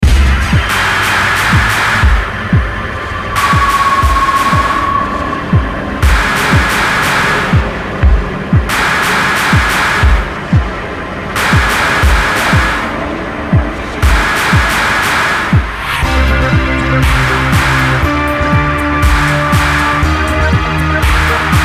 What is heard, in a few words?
BEAT HAUNTING, Beat Haunting Eerie Synth; Miscellaneous Loops